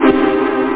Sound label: zong, synth